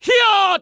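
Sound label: Mon Man ATTACK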